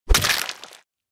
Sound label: kill sound effect; among us